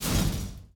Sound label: spikes disappear; Hollow Knight